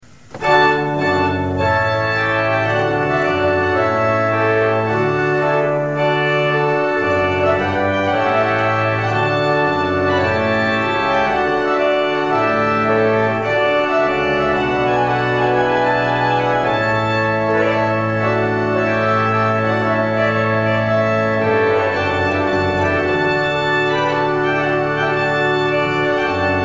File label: Trumpet Tune Purcell; hochzeit